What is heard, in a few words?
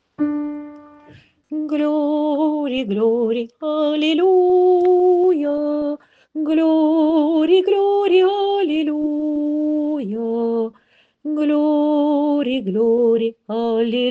Glory alleluia Alto refrain